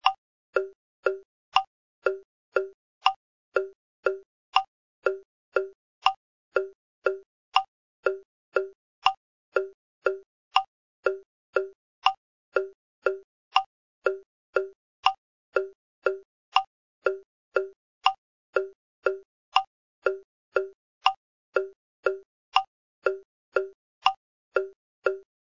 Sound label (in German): click, volksmusik bass